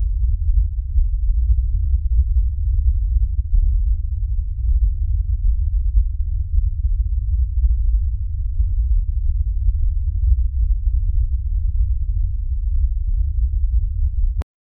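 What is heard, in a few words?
looping ethereal low frequency; cosmic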